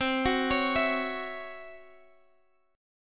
C sharp Minor Major 7th Double Flat 5th C, guitar chord